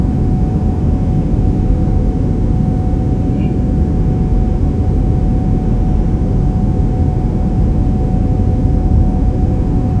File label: calm cinematic sound insi, air circulation